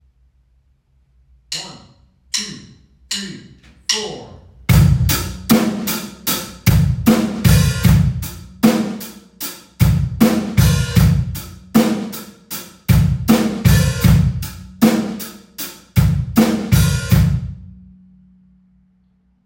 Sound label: hi hat techniques